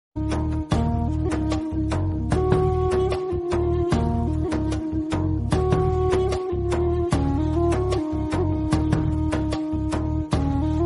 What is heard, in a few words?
paruthiveeran love bgm ringtone